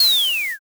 brake

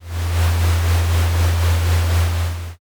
conveyor

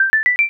score up

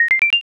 score up

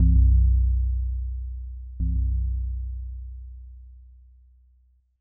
joya bell

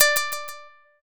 shamisen

